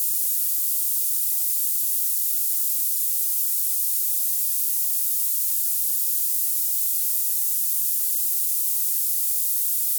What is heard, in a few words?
noise hp